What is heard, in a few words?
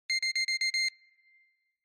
Countdown